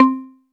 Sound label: RX5 DX MARIM, TONAL PERCS; Crypton - DrumBase5000